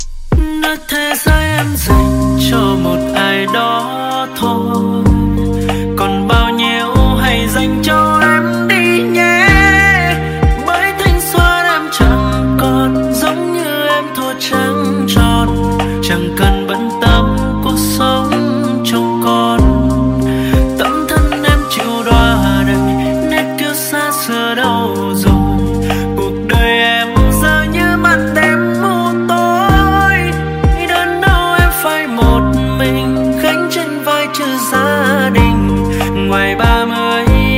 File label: Lofi